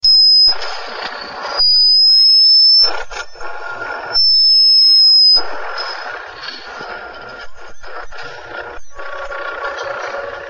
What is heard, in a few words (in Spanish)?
inetrferencia